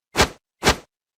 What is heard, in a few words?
vogue